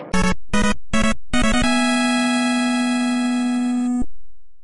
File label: victory sounds; mega man 2